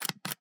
Camera DSLR Shutter Slow

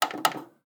VHS buttons; SoundsCrate

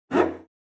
Cello Psycho Screech; SoundsCrate

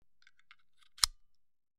Pistol Magazine; SoundsCrate

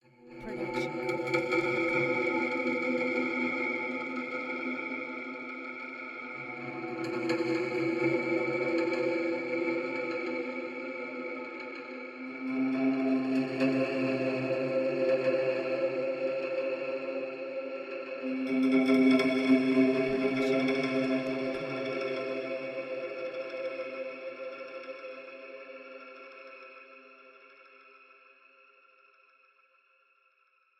ghostly hits; soundscrate

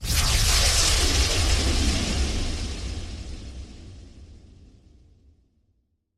magical fire; soundscrate

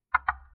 wood door knock other side twice

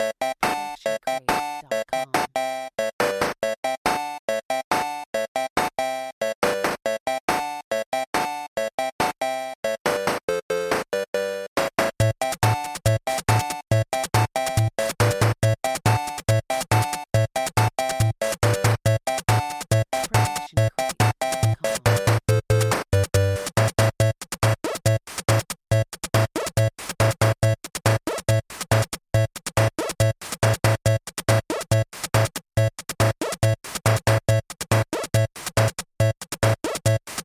Chiptune Tick Clap; SoundsCrate

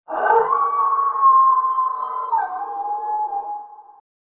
wolf howl; soundscrate

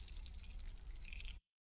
stylized squish; soundscrate

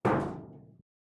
Metal Footfall; soundscrate

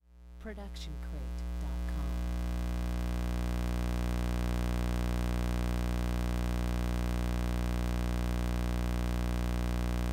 TV CRT Ground Noise 60Hz US; SoundsCrate

